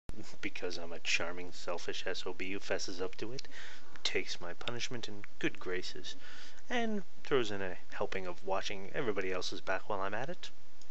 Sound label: sob